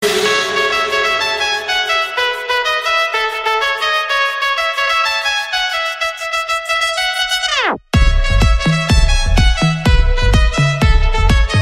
guaracha

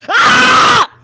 Grito

